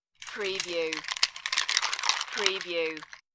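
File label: Ice Glass Swirl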